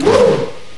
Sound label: swoosh; American Poker The Legends II